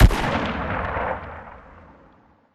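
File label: mid distance attack tail; Titanfall 2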